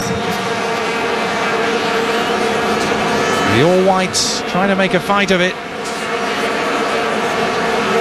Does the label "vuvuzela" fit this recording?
Yes